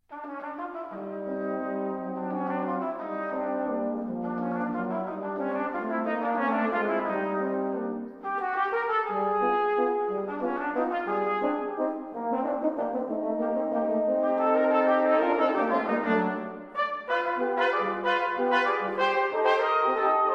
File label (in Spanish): Vals Peruano; Suite Americana III